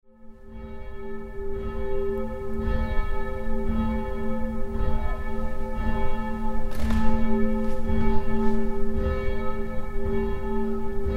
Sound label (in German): Grosse Glocke